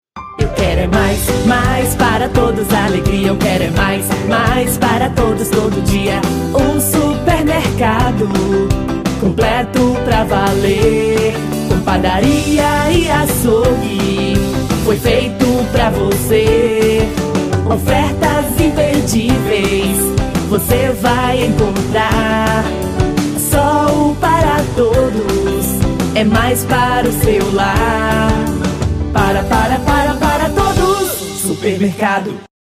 jingle supermercado

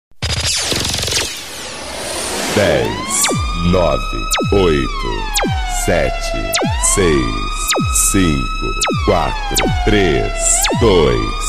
vinheta de abertura

